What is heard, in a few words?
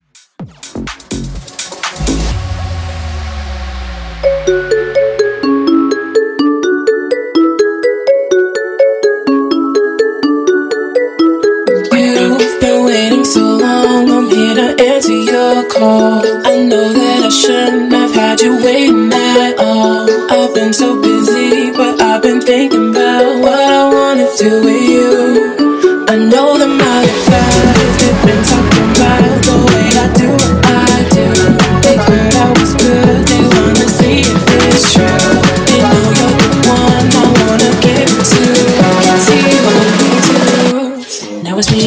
Mashup 125 BPM